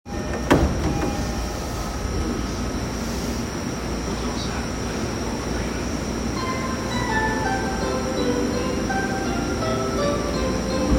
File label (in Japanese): JR東海道線大磯北, train sounds